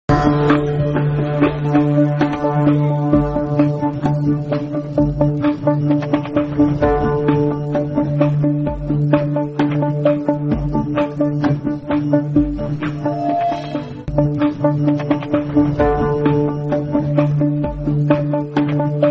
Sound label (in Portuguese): um som de suspense